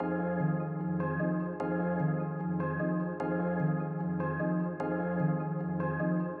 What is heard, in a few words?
Synth Pad; knights; Galahad